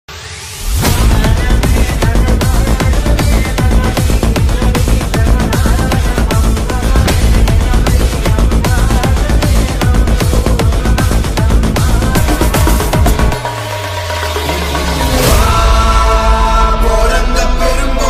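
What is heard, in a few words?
background music ringtone malayalam ringtone background music; turbo bgm ringtone; mammootty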